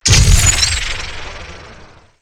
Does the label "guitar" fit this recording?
No